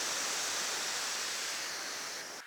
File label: noise